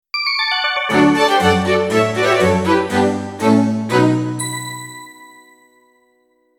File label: jingle; yuuga